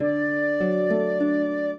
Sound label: minuet, flute harp; Mozart